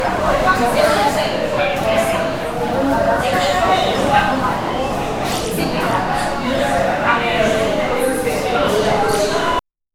a high school cafeteria, metal clatter

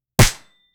heavy slap attack

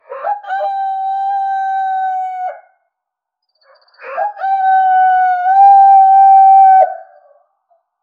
the cry of an elk; animal call

